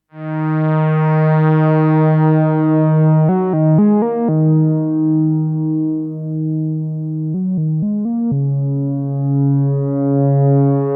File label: PERfourMER PWM